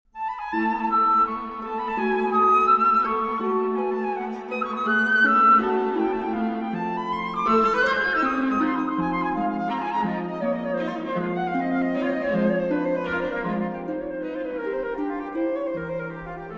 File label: Trio; Genzmer